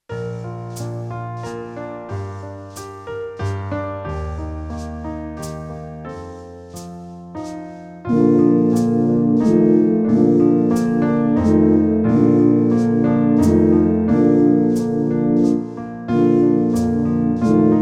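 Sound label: Skaters Waltz, backing tracks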